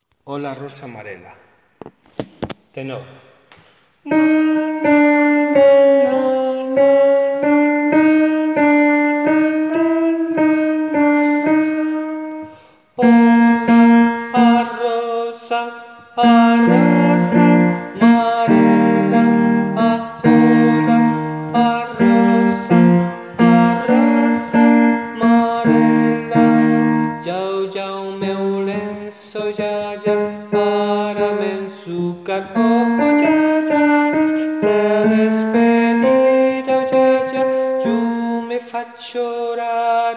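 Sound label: rosa marela tenor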